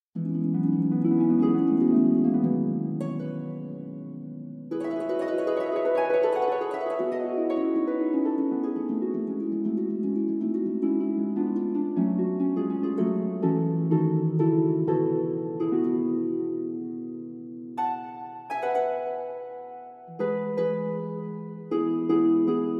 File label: Harp